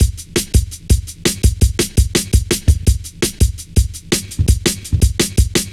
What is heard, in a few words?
Fat Stepz, Drum Loops 167.5; Zero-G Jungle Warfare 1, 2 & 3